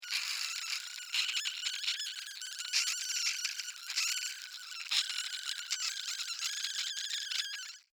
Insect Voice Pain, Insect, Voice, Pain, Vocalization, Bug; Sound Effects:Animals:Insects; Blastwave